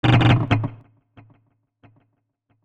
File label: Reel Drop Noise; CashBomb